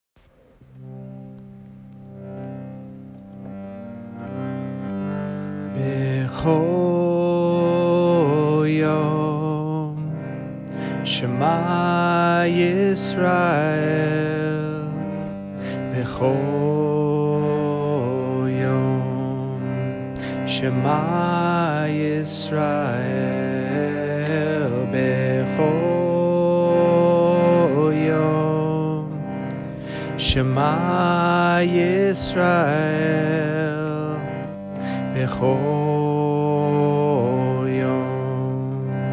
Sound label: chant; bkhol yom shma